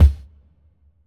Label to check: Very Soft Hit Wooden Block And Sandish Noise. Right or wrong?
Wrong